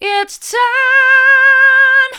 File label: it's time mid, vocal